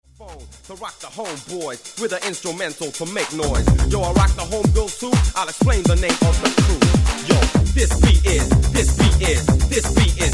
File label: Roland TR909; syntezatory